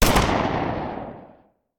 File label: Hand Gun; Sound Ideas; Guns